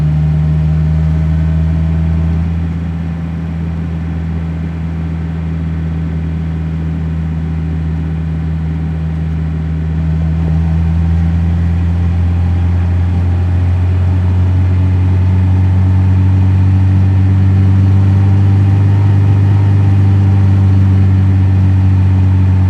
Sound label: Baby Oasis Car Ride